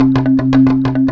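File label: bamboo tremolo; Greytsounds; Scott Peers Sound Engineering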